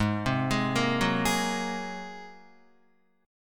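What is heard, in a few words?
A flat 9th A flat Guitar, chord; Ab9 arpeggiated; JGuitar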